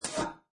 Distortion Button Blurp, Science Fiction Sound Effects; Scifi Production Elements, Set 2